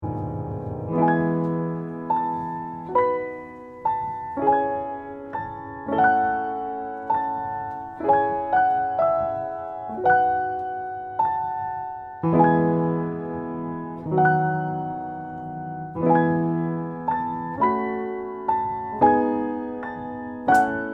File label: Music Box; Final Fantasy V